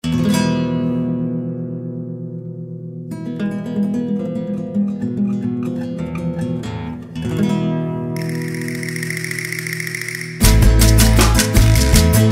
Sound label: String